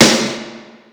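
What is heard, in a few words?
Snare, Yamaha SY22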